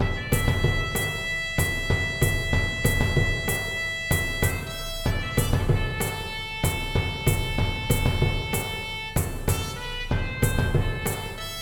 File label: Epic Loop